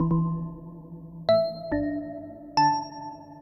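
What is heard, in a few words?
synth electro 140 A; Techno; Keys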